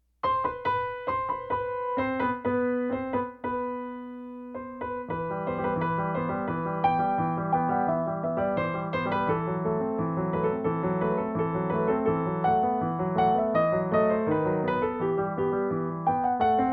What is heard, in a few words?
Theme from Symphony in G Minor